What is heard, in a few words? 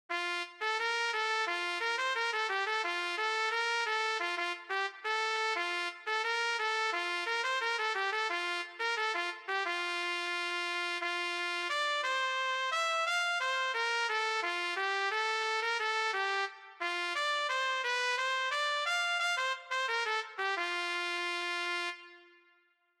Trumpet; 吹奏楽; R6 吹奏楽部 卒部式